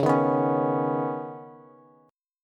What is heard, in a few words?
Db 7th Suspended 2nd, Bass, Db7sus2 chord; JGuitar